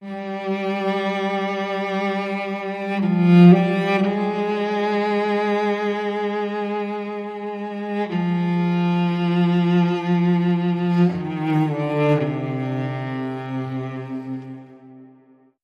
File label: Cello